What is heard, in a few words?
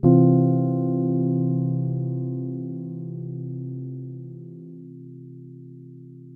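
EPIANO Soft Rhodes Chord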